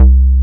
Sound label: bass X-Pander; Best Service Gigapack 2